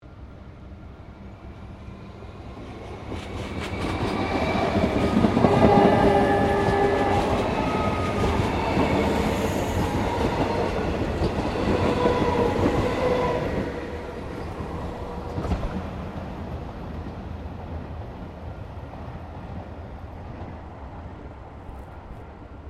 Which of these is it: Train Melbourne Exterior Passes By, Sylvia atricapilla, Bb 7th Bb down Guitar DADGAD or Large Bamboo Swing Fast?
Train Melbourne Exterior Passes By